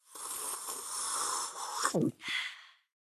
Girl Drinking; Level27

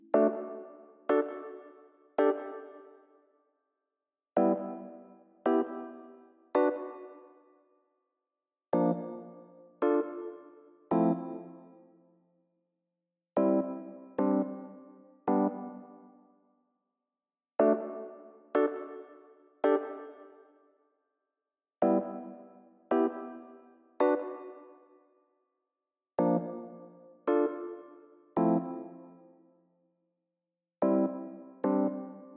rhodes; Toki Tori Collection

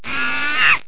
Monkey scream

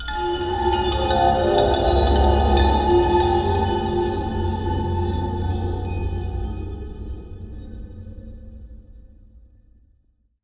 Bunker Cutters Pickup

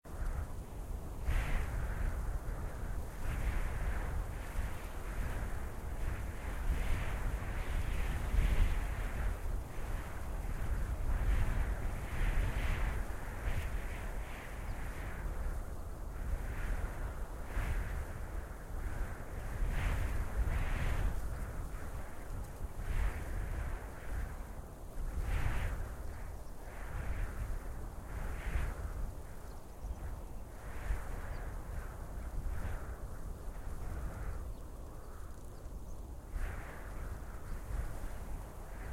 Wind mod soft; children of morta; Tutorial

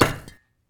Hit Generic Brick

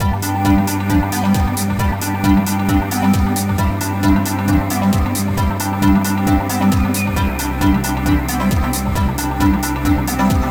Goal Theme; super mario strikers gamecube

